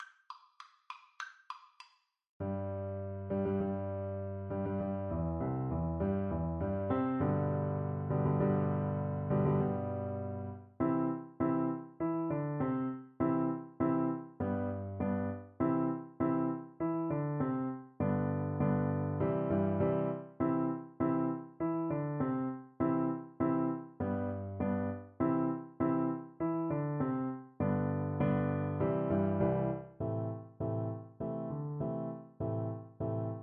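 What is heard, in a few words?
viola karaoke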